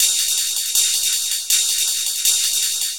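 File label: percussion, Drum+Bass, 160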